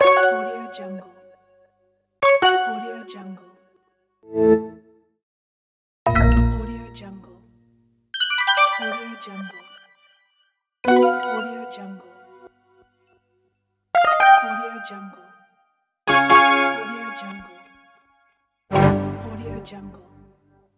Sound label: interface soundpack